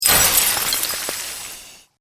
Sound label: UI card; Paradise